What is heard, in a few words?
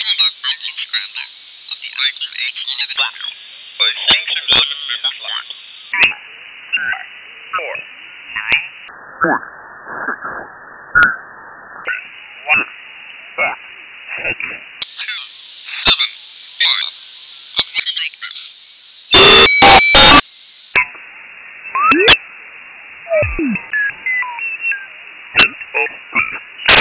scramble IN THE CLEAR